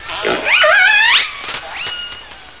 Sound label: WHALES